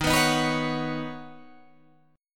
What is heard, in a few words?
Eb Minor 7th Sharp 5th, Guitar DADGAD